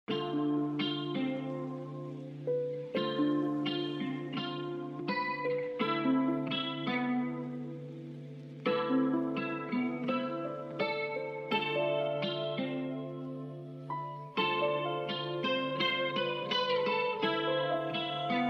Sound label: instrumental; persian